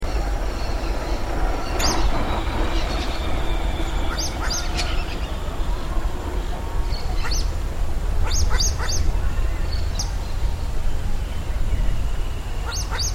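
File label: Pato Real, Cairina moschata, Muscovy Duck; cantos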